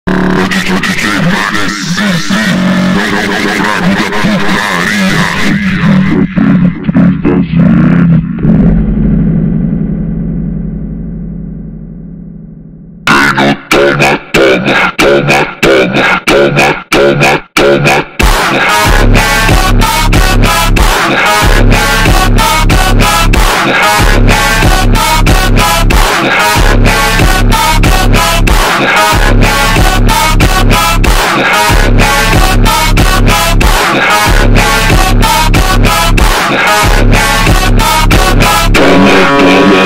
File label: ultra slowed